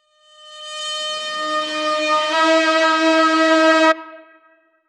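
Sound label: starts with a royal trumpet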